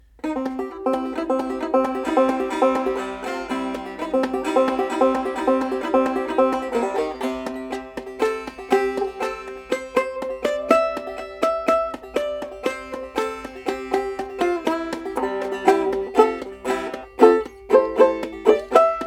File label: steel